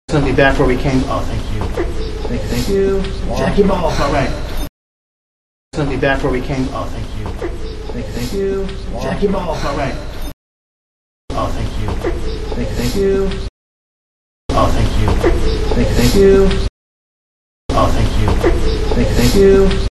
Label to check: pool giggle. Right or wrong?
Right